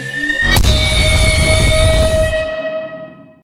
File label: Laser Cannon